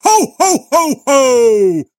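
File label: frequent bursts of laughter; zvuki deda moroza novogodnie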